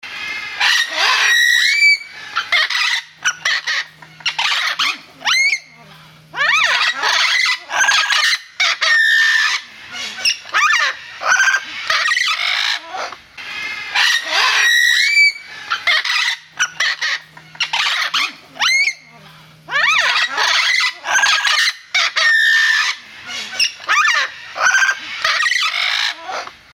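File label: Mealy Amazon Parrot, parrot calls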